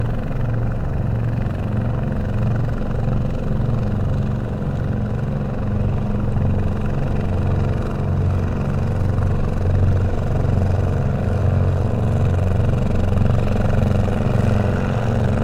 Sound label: boat engine